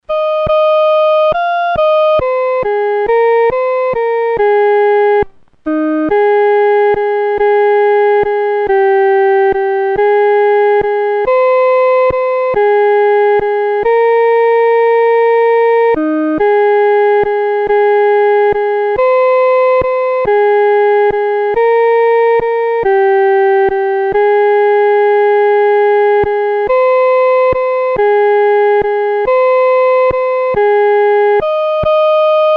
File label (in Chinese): 独奏 第一声